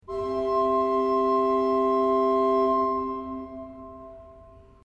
flauto in VIII 4 C; izola

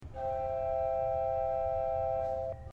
fugara 4 C; srednja vas

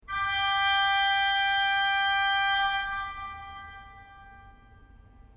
decimanona C; izola

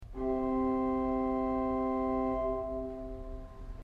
flauta traverso 4 C

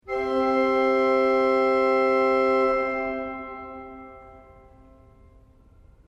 ottava 4 C; izola

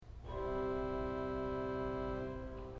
eolina 8 C

